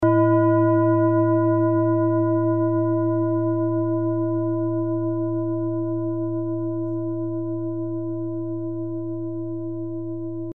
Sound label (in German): bengalen klangschale